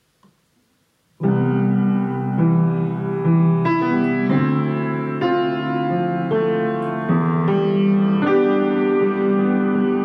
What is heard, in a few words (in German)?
klavieremotionen